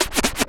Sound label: SCRATCHES